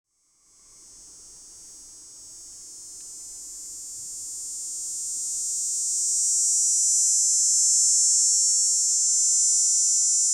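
dog-day cicada male, Northern Illinois Insect Calls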